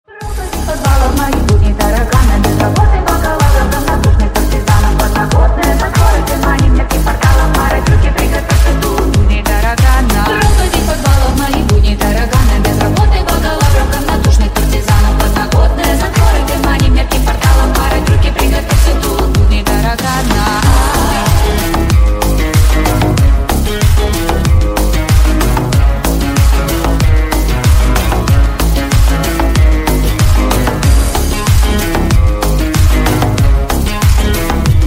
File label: remix